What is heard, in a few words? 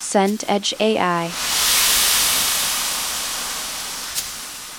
waves ocean distant